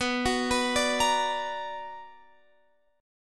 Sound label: B Minor Major 7th, Guitar, Open F; BmM7 arpeggiated